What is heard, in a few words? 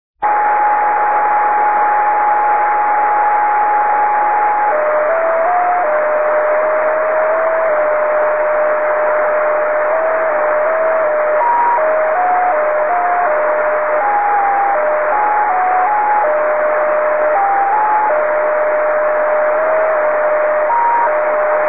B 4m Tropo; GB3RAL